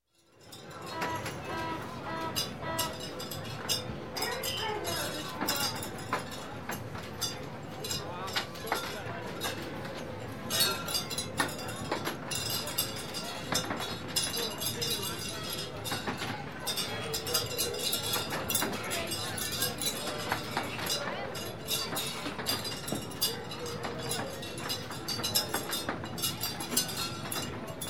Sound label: Fair, Amusement Park Ring Toss Game Crowd; Sound Ideas 2000 Series